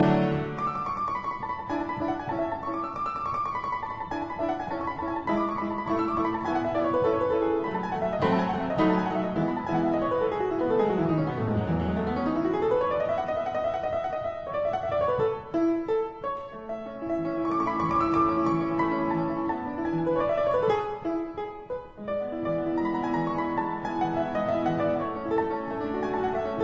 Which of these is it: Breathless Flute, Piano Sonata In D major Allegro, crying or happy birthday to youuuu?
Piano Sonata In D major Allegro